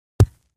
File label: Ground Hit Sledge Ham; rocks & dirt